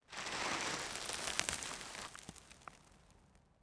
sand debris; ambient; World of Tanks Blitz

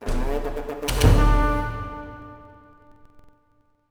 Air Attack; Civ4 warlords

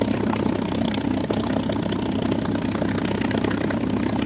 mustang rear, engines; War Thunder

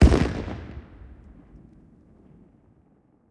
plane; World of Tanks

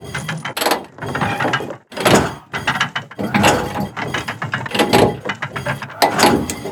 turret, tanks; World of Tanks

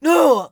hit; Escape from Tarkov; scav2

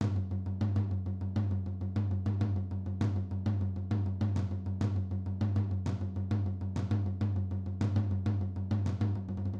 music interactive; World of Tanks

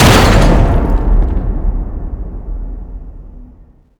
foot

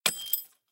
shells 20mm ground; guns; tanks; War Thunder

